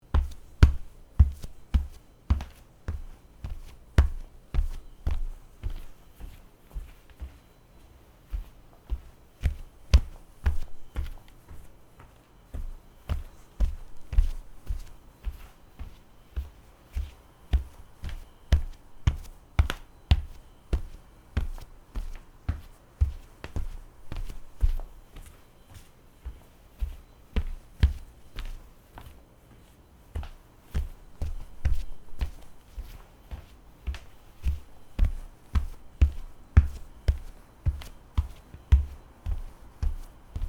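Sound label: footstep wooden flooring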